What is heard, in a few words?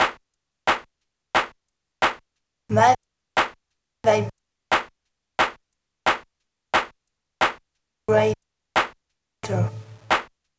claps